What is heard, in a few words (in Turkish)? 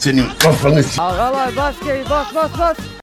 bass geri